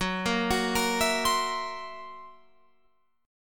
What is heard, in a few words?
F sharp 7th Flat 5th F sharp down Guitar Open F; arpeggiated; F#7b5